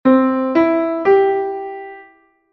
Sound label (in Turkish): do major melodik